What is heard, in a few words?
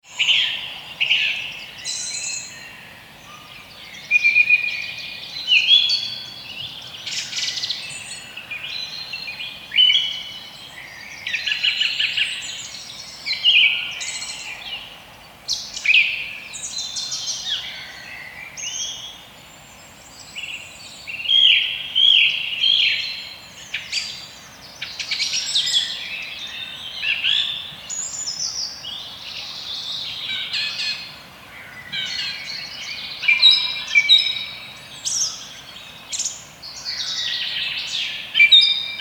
forest ambience morning spring, poland